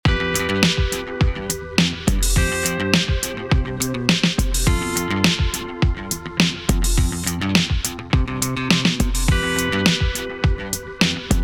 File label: Drum Tour